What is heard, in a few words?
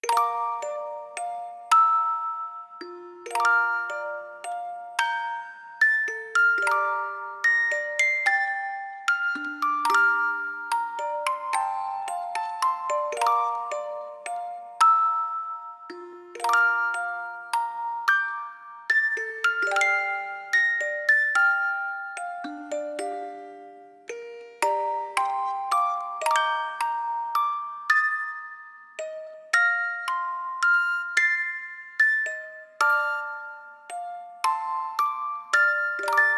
Bgm main theme musicbox